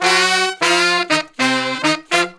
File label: Horn Section